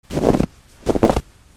FOOTFALL

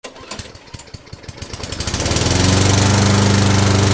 GARAGE

